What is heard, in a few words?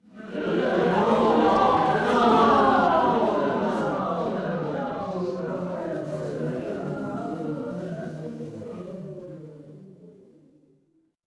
crowd gasp